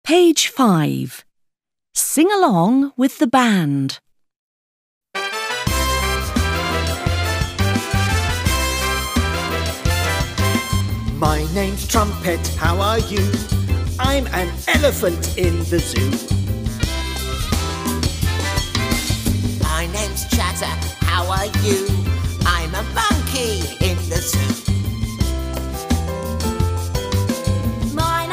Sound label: sing along